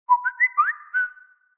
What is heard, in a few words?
Whistle; Samsung SGH-i847-Rugby-Smart